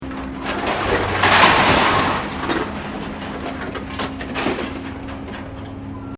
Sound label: Industrial Scrap Metal Loading Pickup, Scrape metal being loaded onboard ship; Machinery Sound Effects